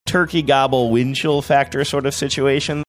turkey gobble

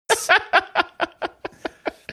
laughing

